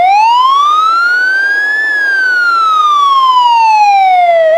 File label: wail, sirens; code3 banshee